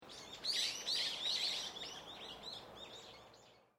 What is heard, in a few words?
Bird Group Tweeting, Australian Birds; Animals